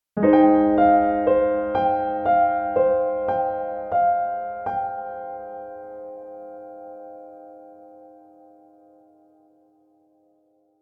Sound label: jingle